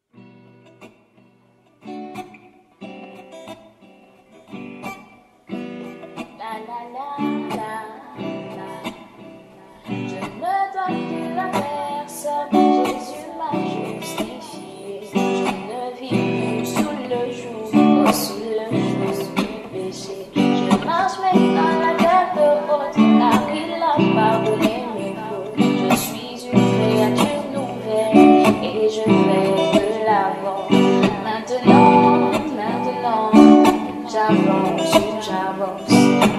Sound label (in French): Chant